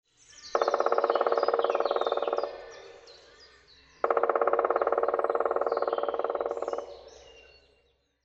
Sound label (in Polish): dziupla dzieciola glos; dziupla dzieciola odglosy; multigrafia